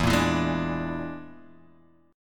E 7th Flat 5th, down, Guitar, Drop D

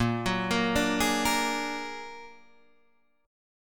Bb Augmented 7th Bb down Guitar Standard; Bb+7 arpeggiated; JGuitar

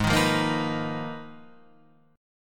G sharp Minor 6th Add 9th

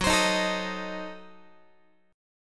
Gb Diminished 7th Guitar; Gbdim7; strummed; x,14,15,13,13,14

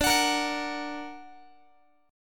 D Augmented D down Guitar; D+ strummed; JGuitar

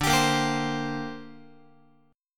D 7th Suspended 4th Sharp 5th D Guitar; strummed; JGuitar

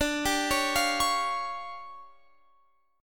D Minor Major 7th Double Flat 5th D, guitar

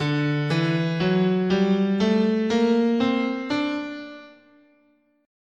D Fifth Mode, scale

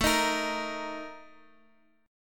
Bb Major 7th Suspended 4th

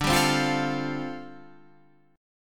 G 7th Suspended 2nd Suspended 4th D

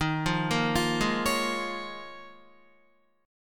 Eb 7th Suspended 2nd Suspended 4th down Guitar; Eb7sus2sus4; arpeggiated; JGuitar

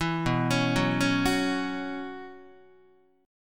B Suspended 2nd Suspended 4th B Guitar, chord; Bsus2sus4 arpeggiated; 4A,4E,5A,5D,5G#,6C#; 7,7,4,4,5,5; JGuitar

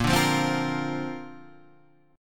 A sharp Major Add 9th; A#add9 Chord {6 5 3 5 6 6} strummed

